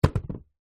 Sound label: rollerskate down on ground; sport